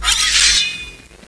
katana draw; wpnmod

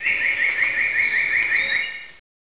ambi, jungle; gearbox

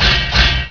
armor

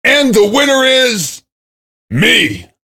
cheer; svencoop; voice commands; SeriousSam

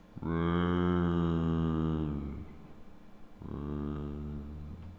zomambient

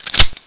colt bolt foward, weapons; dod

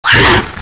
hu pain; svencoop

